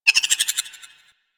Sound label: Landscape Cave Creature Calls, Ambience